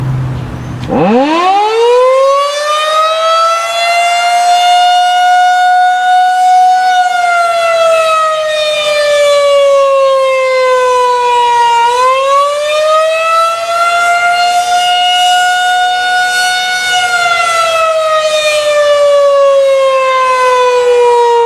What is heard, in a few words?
Fire Siren